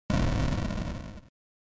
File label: NES General Noise Single